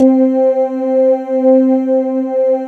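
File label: rotary organ C4; ROTORORG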